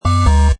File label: Denied, Servo Panel Sound; Button Sound Effects; Multimedia; Lynne Music